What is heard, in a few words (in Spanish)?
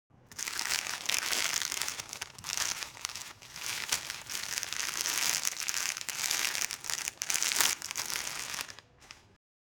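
sonido de papel siendo arrugado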